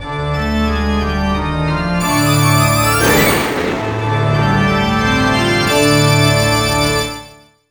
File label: BGM Horror; Irodzuki Tingle no Koi no Balloon Trip; NDS